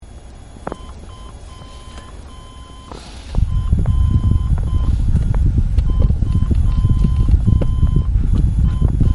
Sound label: rechnoj cw